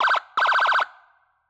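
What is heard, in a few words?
exterior emergency siren; sonniss gdc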